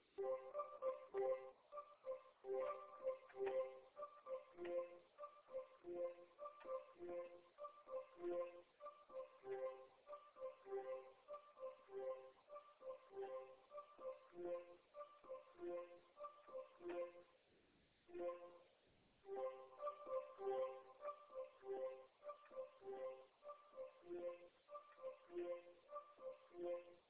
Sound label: Intro pad